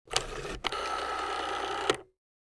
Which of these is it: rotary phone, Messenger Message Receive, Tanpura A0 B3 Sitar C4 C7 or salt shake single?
rotary phone